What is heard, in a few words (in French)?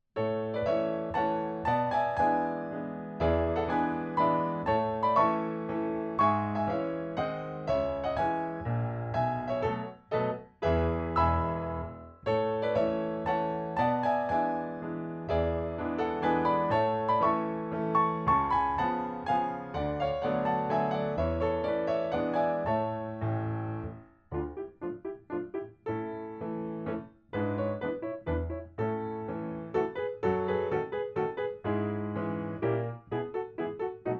playbacks mazurka; barouf